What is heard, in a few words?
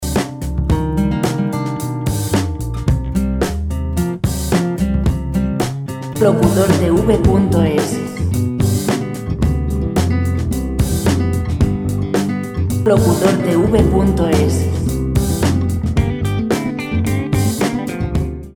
110 guitar